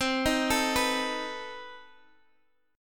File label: B 7th C; B7/C; arpeggiated